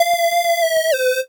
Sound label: Beat